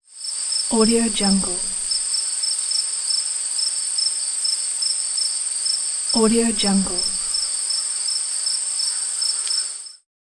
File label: crickets